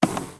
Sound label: Platform move down start